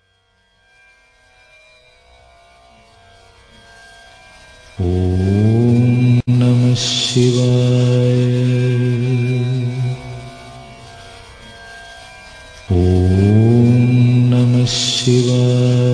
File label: om namah shivaya mantra peaceful chant